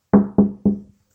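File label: sound of my knock on a window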